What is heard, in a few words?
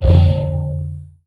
Pickup Scifi Energy